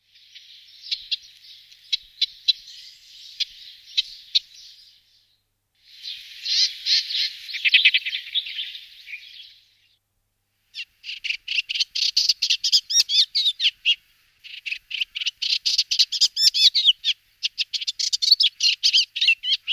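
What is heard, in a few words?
blednaya peresmeshka golos, pale mockingbird voice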